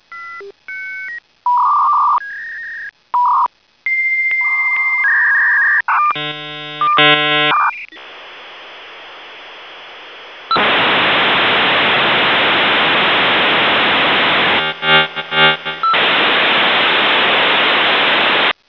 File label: v90, modem